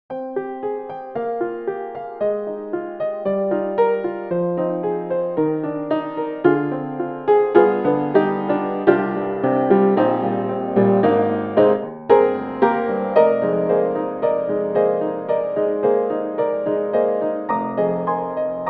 Ragtime Piano; Native Instruments; Kontakt Factory Library(Band)

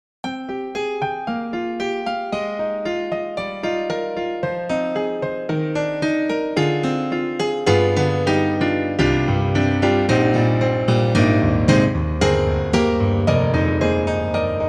PIANO; Roland; SRX-03; SRX3 PIANO2; XV-5050

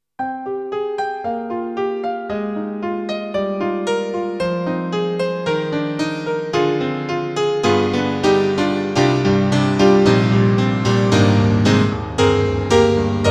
Roland XV-5050 64voice Piano

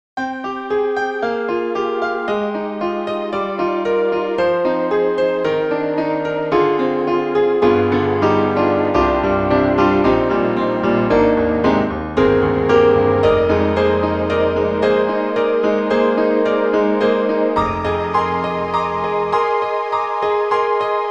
Piano and Strings Layer

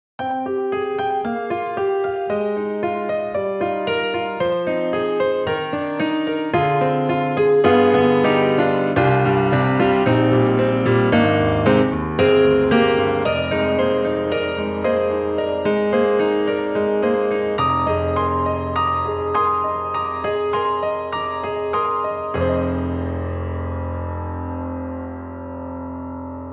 Grand Rhapsody Piano, Goto Grand; Waves

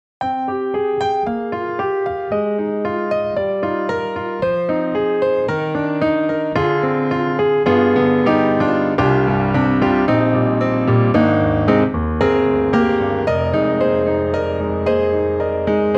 Grand Rhapsody Piano, Over Compressed; Waves

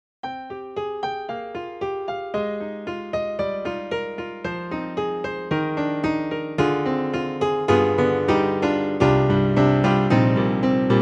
bright piano; EDIROL; Super Quartet

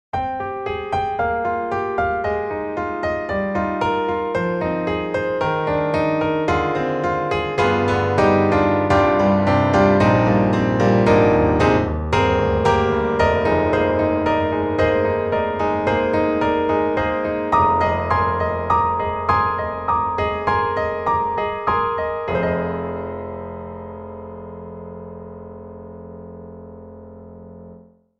Piano Amber Large; 4Front; Truepianos

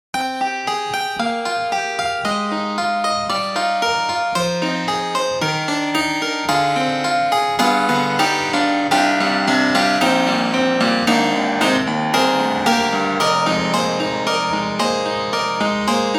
Grand Rhapsody Piano, Tack Piano; Waves

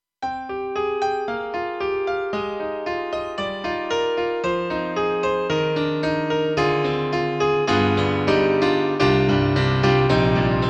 Korg M3 Thin Bright Grand Piano; XPanded

